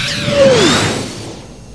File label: WARP; HIGHTECH